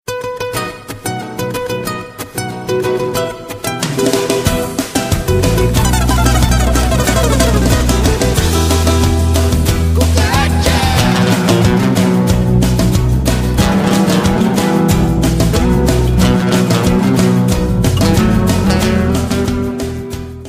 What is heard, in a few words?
cembalo theme; Country